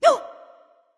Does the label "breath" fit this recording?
Yes